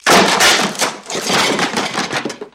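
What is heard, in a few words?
human falling sounds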